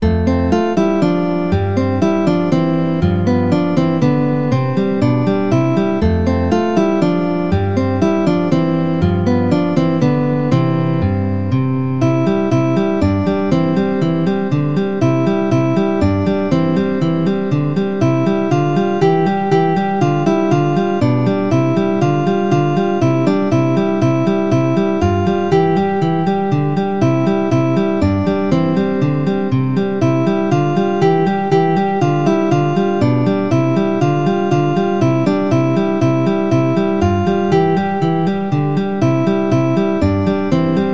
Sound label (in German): winter ade gitarre